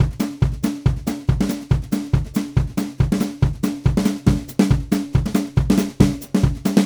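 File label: Country Drums, Verse 2 Section 2; Digidesign; Pro Tools Essential Loops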